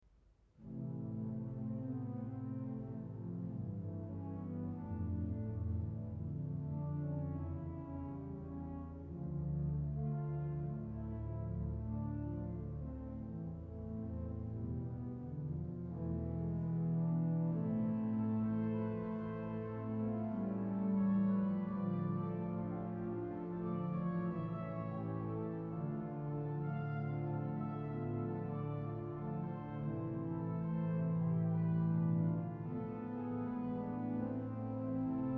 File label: organ passiontide